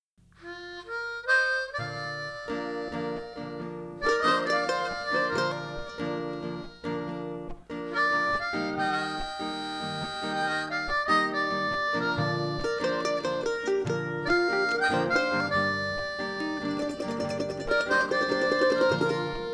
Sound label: voc git harp mando mix